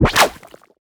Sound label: Background Otter attack